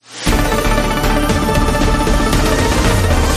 Total Win Bgm

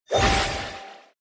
Appear Scatter Sound

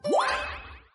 Click Feature Buy Btn

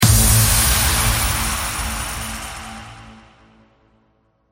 Total Win Jump

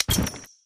Click Small Game Start

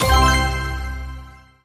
Slot Msg Total Win

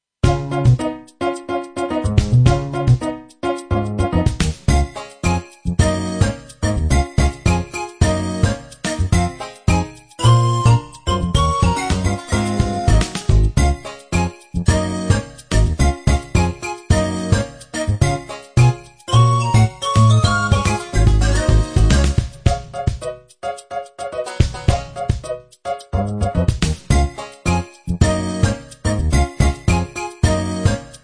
Normal Bg Bgm

